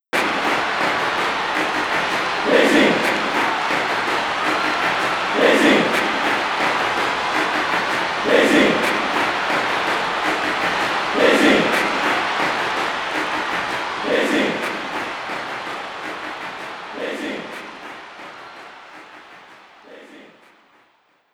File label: Crowd Chant; mario strikers charged football wii gamerip 2007; Daisy